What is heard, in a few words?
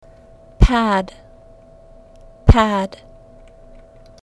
pad